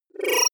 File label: disappear flicker short, signale; soundpool